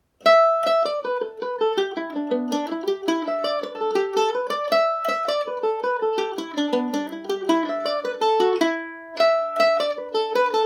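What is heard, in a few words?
E dorian, irish mandolin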